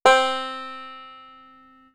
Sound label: c banjo